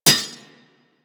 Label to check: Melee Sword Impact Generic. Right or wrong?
Right